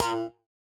Stab; neuro; Optiv & BTK

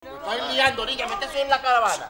VOC, Gipsy; Best Service; Ethnic Journey Sound Cube

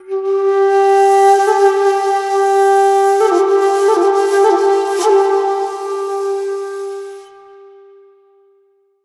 Shaku, Wind Instruments; Sonic Foundry (Sony Creative Software); World Pop

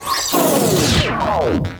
Kaos FX, Ambient Grooves

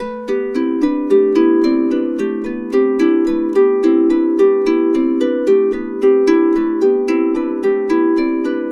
110, Complete Harps; USB Soundscan vol.62; AKAI

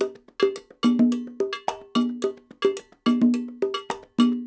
Percussion, 108; Sampleheads; NYC Percussionworks; 90 s Sample CDs

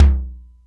SDS9 LT, Simmons SDS-9; SDS9 Factory A3

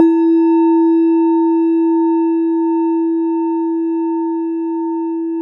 JAPANESE, Japanese Bowls

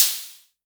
Crashes; LIMAT Div Dept; Drums

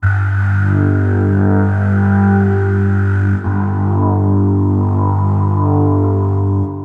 Space Bass; Sonic Foundry (Sony Creative Software); Knutrix Drum & Bass

